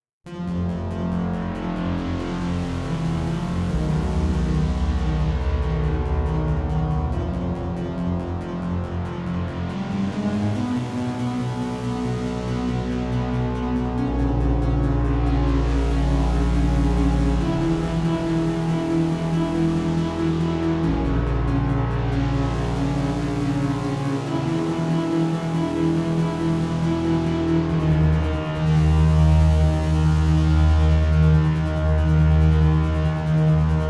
Music Template - Tension; mass effect 2

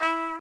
E Trumpet; Notes; Scratch

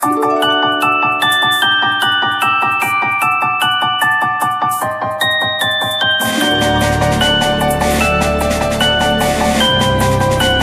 jingle map

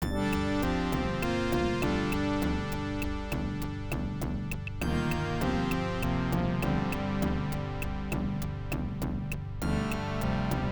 War Drum; Xuanyuan Jian Waizhuan Feng Zhi Wu; IBM PC AT

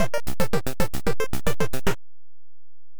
Phase Clear; Gyromite; NES

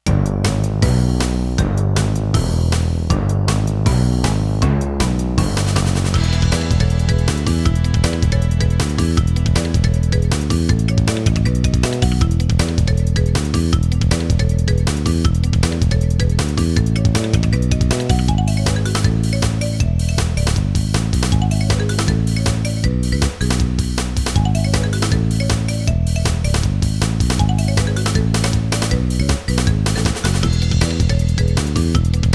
Race Lose Fanfare; crash team racing

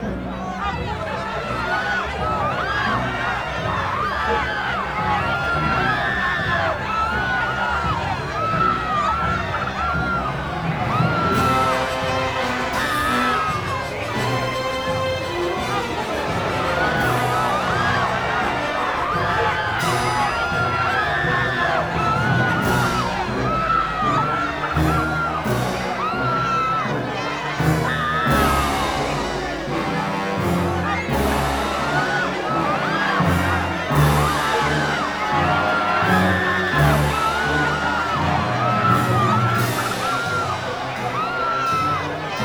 Rescue Workers w. Ambient; Shrek 2; Papa Bear's

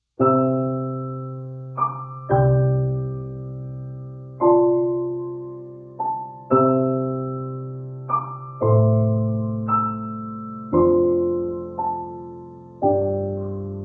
piano; Minecraft

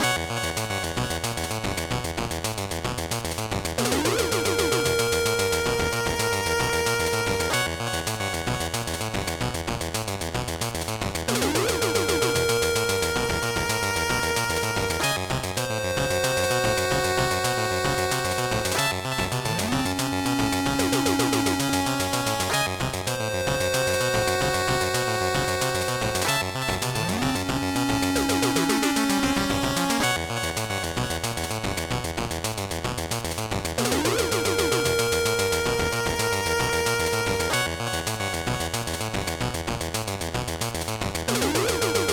Alert; Metal Gear Ghost Babel

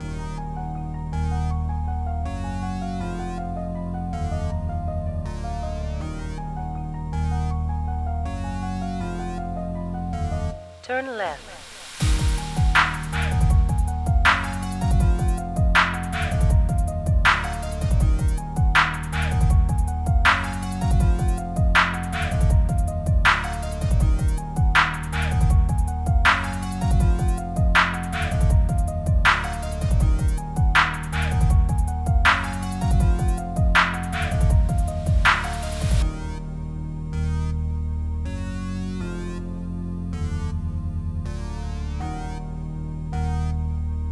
Instrumental

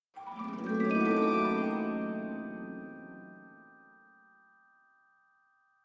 Sting; lego the incredibles 2018 switch

